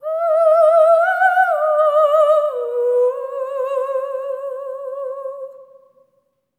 SERENE, Symphony of Voices; Spectrasonics

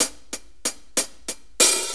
6-8 HiHats, HiHat Ride Loops; Drum Tools

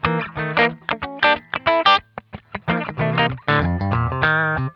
Funk Guitar; Zero G - Funk Guitar

